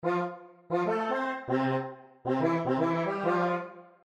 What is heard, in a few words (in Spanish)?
voc saxos